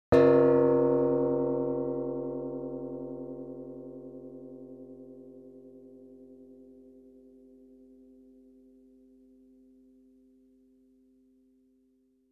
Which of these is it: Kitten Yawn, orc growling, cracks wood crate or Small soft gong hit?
Small soft gong hit